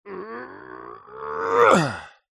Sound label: human male voice grunt groan lift heavy weight